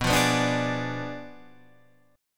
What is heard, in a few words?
B Diminished 7th B down Guitar Standard; strummed; JGuitar